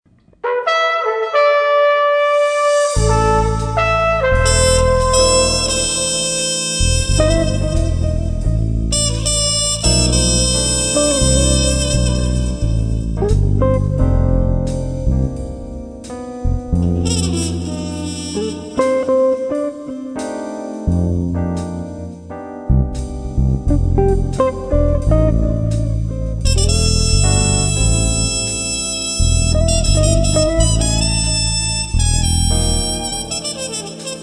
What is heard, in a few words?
Jazzbar